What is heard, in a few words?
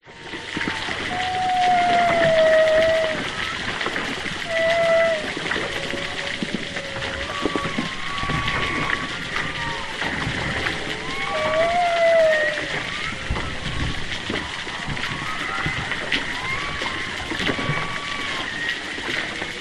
whales humpback underwater distant east australia choppy water; water movement; zapsplat